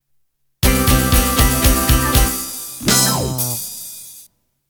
6/8 12/8 Ballad Intro

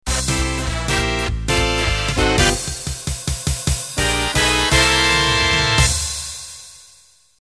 Quickstep end

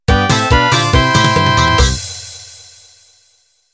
Quebradita End

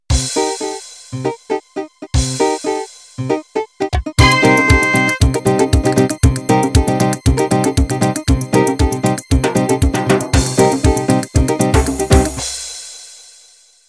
Gypsy Pop Intro

